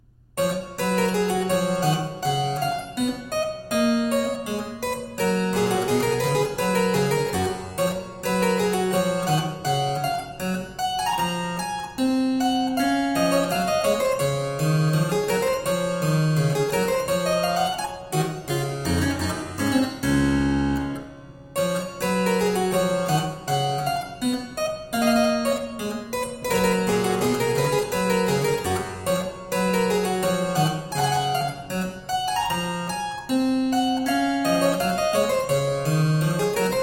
Cembalo Solo- Aria Dolce, Harpsichord; Georg Philip Telemann; Der Getreue Music Meister

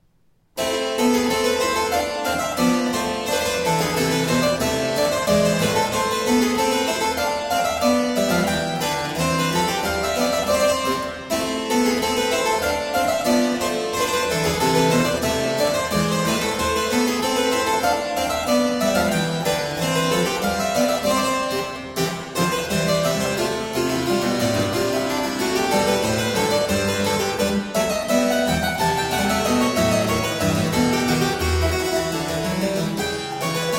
Suite No 3 in B minor BWV 814 Anglaise; Bach; Suites Francesi

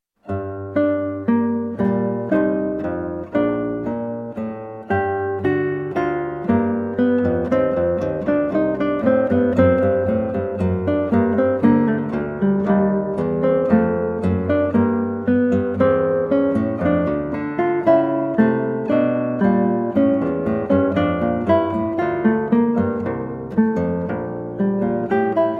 Sonatina in G Minor, Guitar; HWV583; George Frideric Handel

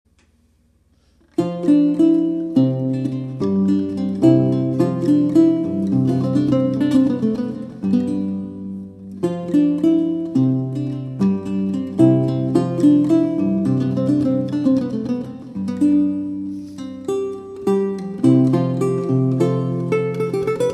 Branle Gay, Lute; Pierre Attaignant

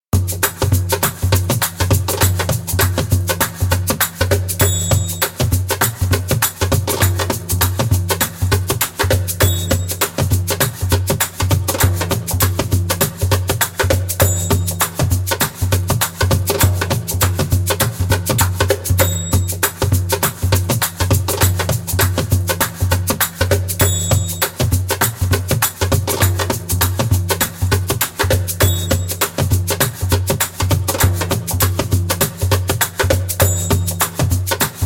Darbuka Second Line (fast) spoken

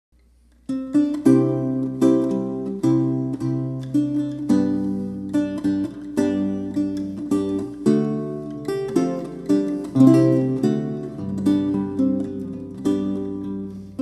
Guillarde milanoise, Lute; Guillaume de Morlaye

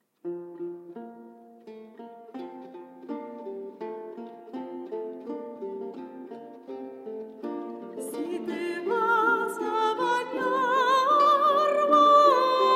Vihuela Quartet; Si te vas a ban'ar Juanica

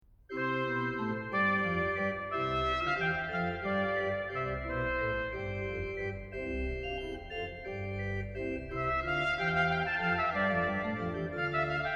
Sonata Prima per tromba sola, organo e tromba; Presto; 'Capricci armonici da chiesa e da camera' Op 4; Sonate per organo e tromba

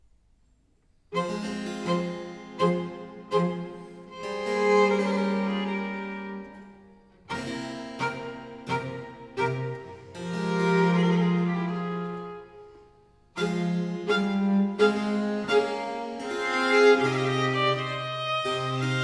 Sonata No. 1 in A Major (Larghetto); Haendel; Sonate a trio op. 5

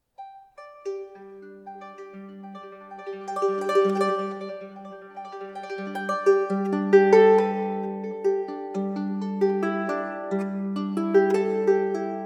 G Mode Improvisation, The Harps in the Trees

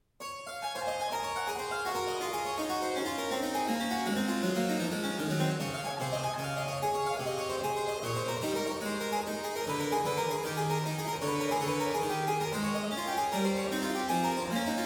Giga Allegro, Streicher Trio; Musica da Camera

